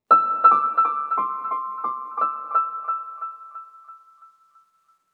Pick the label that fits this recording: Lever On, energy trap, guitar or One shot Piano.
One shot Piano